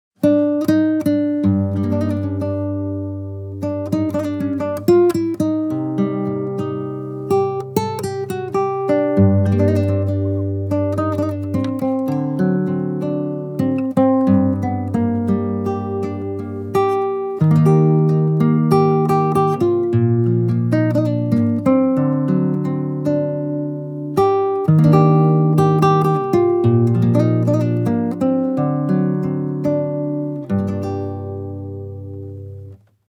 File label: Test sound yamaha c40